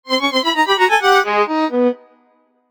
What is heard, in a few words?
goofy ahh ringtone